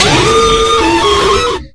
nightmare circus jumpscare sound

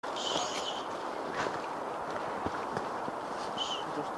shhh

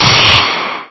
parry sound; mugen

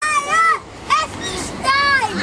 freestyle

